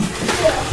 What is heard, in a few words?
Chair; VOICE ISOLATED; SECOND FLOOR HALLWAY; DISEMBODIED VOICE